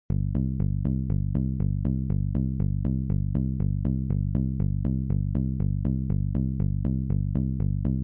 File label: Solo